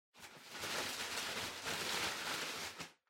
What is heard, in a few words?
Packing Paper Far Rustle; 梱包紙; 遠い ガサガサ; 梱包材